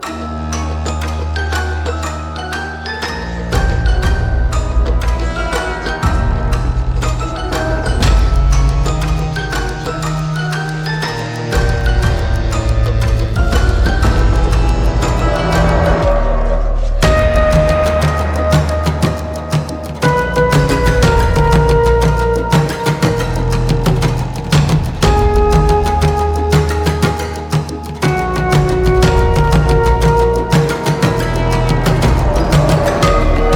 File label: main soundtrack; dungeon quest roblox